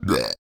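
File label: ambience China 3D ambience; Rabbids Multiverse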